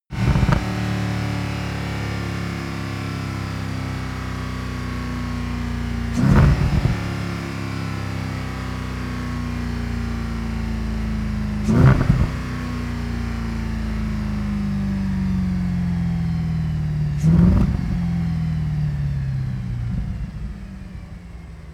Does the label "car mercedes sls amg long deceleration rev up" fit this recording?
Yes